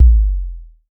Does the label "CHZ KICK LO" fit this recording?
Yes